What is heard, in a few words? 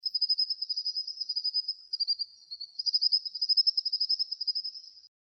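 common sandpiper call; isoshigi nakigoe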